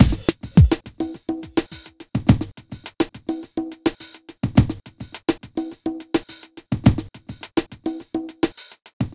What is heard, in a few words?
philter beat; Sonic Foundry (Sony Creative Software); Ugly Remnants VOL-2